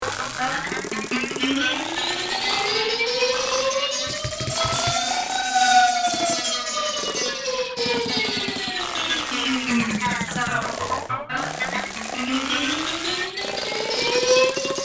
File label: crunchy